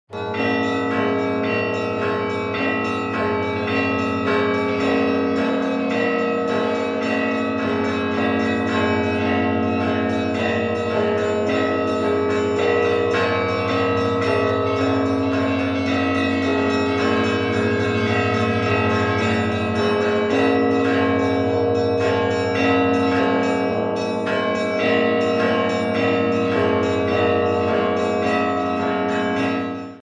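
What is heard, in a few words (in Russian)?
zvon v traditsii Troitse-Sergievoy Lavry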